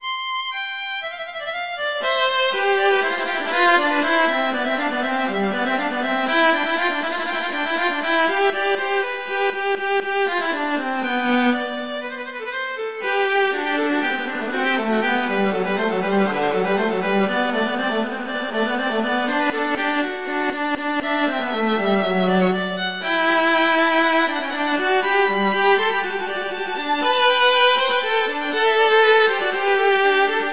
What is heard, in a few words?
Allegro; Telemann; Canonic; Sonata 2